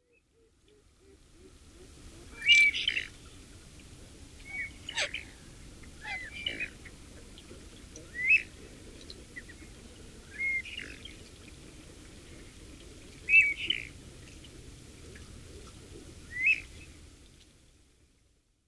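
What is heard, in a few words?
Falcated Duck, imba; yoshigamo; ヨシガモ; 求愛; 千葉県印旛沼; 簗川堅治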